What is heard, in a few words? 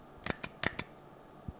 DBL CLICK